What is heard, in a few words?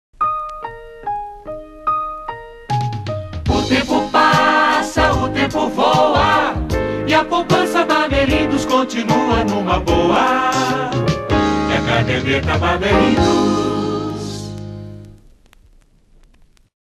jingle; bamerindus